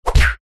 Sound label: cartoon punch